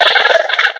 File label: box monster chitter